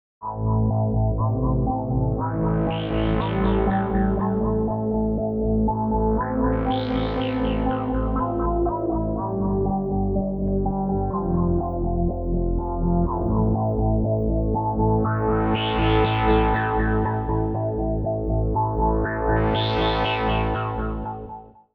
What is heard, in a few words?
spectrum tri seq